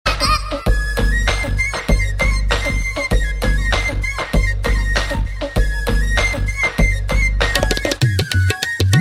Ultimate Flute

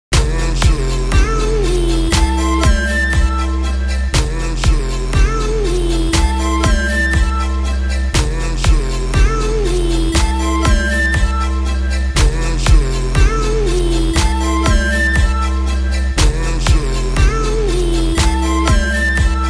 Chill Ring

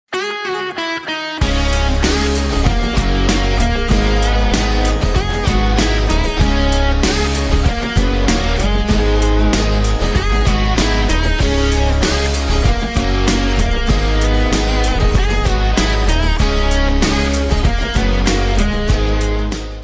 Rock Guitar

